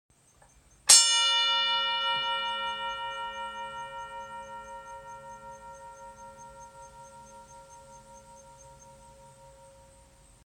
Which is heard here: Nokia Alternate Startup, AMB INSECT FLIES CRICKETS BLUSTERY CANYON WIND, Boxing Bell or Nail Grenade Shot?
Boxing Bell